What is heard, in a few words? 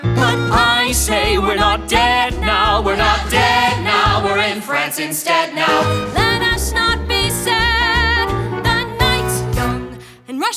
Vocals